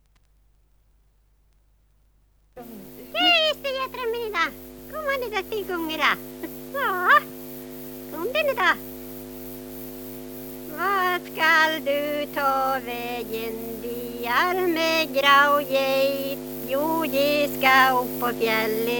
Getlock

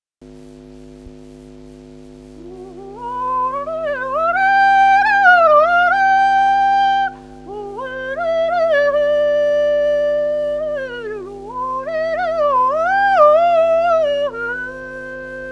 Kauklåt geit

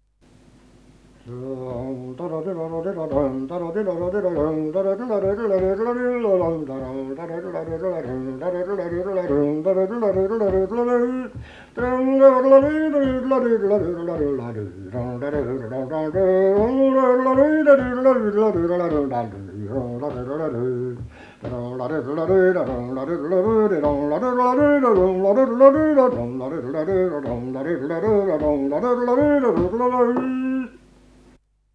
Polska; efter Äng Andreas

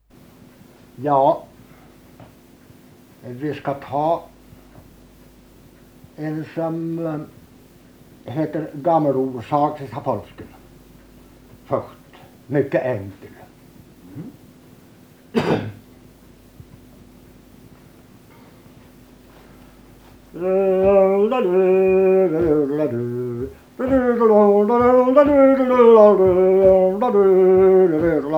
polska; Gammel Ol' Zackrisapolska

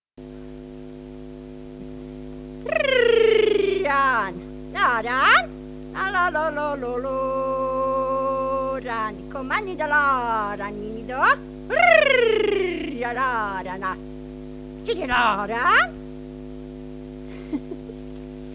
Fårlock

